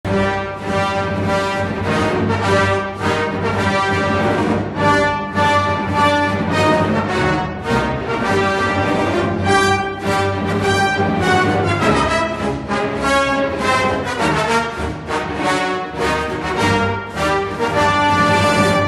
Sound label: loop